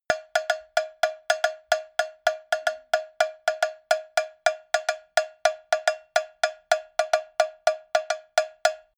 cowbell rhythm

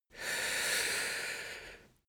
male breath slow in

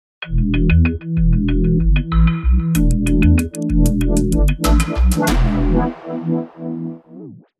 pulsar ticker

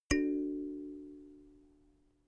notification kalimba triad major soft

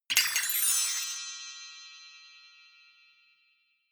percussion

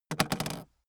aluminium case handle bounce light

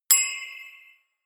notification bell

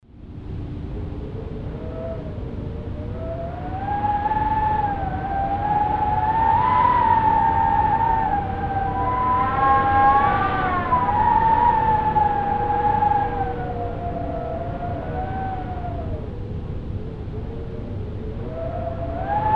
wind against a door

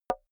wood board knock

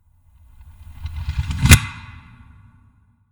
reverse suck in sputter reverb

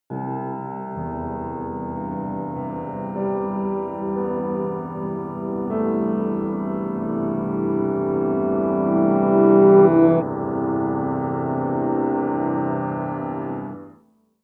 sequence laid-back piano

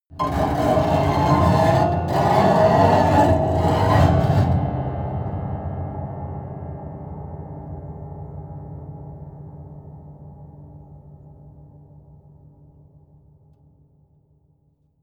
piano bow string broken low slow

